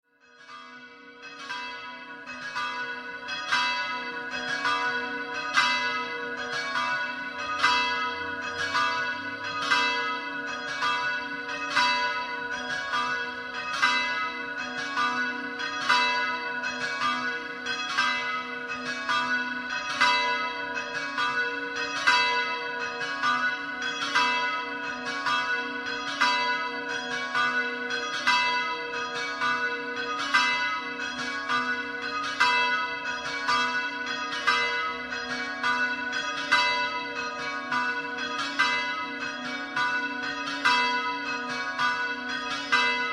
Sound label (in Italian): MOLINIS, campane